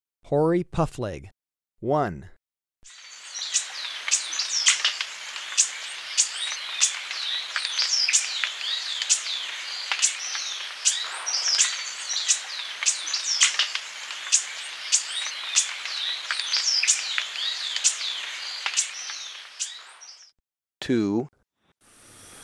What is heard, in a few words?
Hoary Puffleg; S. America